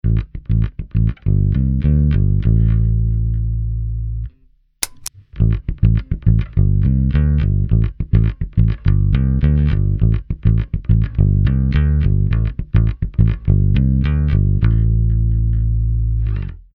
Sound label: BASS